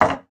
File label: wood misc, wood metal; wood metal SFX